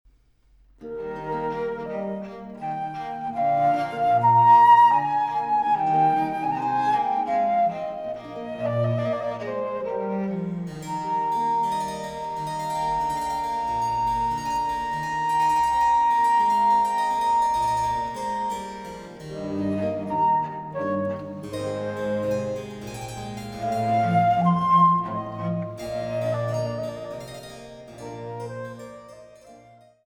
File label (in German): Trio 4, A-Dur für Flauto traverso, Cembalo & B. c.